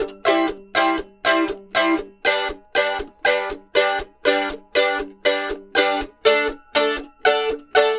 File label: Mandolin Chuffs; Continental Drift Aftershocks